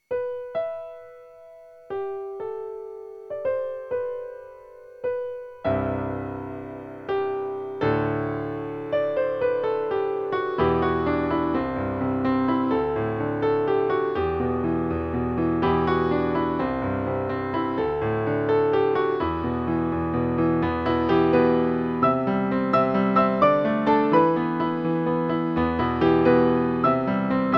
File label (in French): spleen baroque